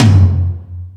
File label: Stadium Rox, Drum Machines